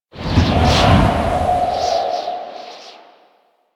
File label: Balloon Move Start; Warframe; Quests; The New War; Vehicles; Unum Tower Balloon